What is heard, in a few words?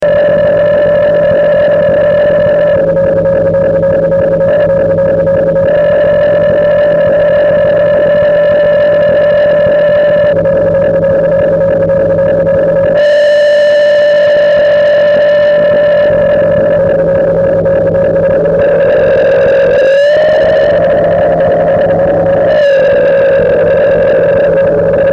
inharmonicity of overtones